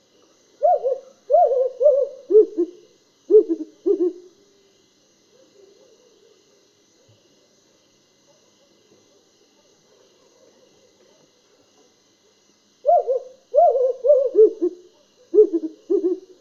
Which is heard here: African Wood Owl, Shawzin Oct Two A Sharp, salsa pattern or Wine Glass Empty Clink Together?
African Wood Owl